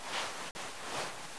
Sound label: pickup; tea